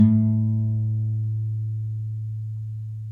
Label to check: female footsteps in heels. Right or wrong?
Wrong